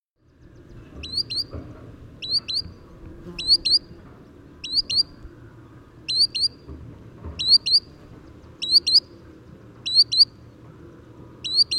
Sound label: Solitary Sandpiper, Tringa solitaria; shorebirds rails